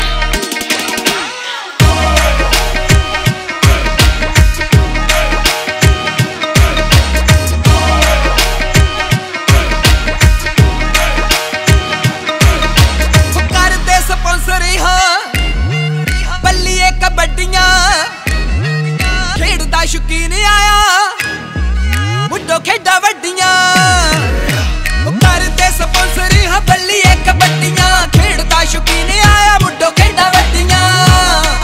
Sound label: Punjabi Song Ringtone; TikTok